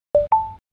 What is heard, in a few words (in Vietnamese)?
am thanh sac pin samsung galaxy